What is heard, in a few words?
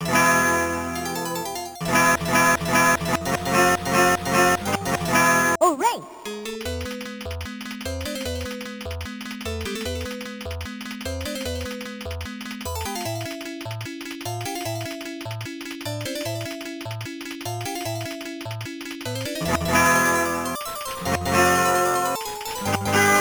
Spanish Tavern; zunzunkyou no yabou; system c 2; arcade